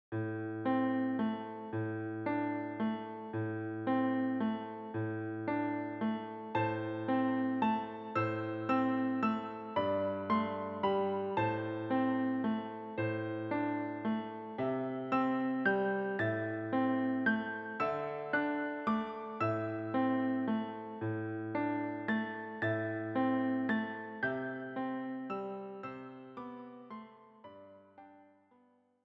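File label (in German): Scarborough Fair Klavier vierhändig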